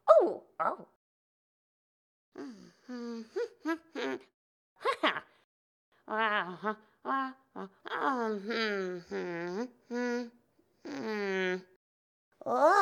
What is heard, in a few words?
merchant rummage loop